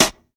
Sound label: 00s present steel snare drum g sharp key